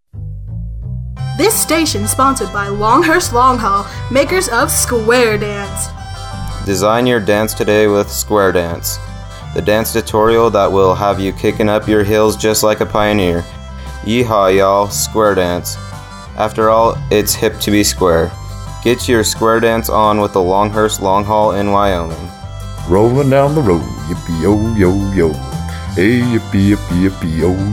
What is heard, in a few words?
Square Dance